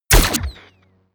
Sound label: turn on off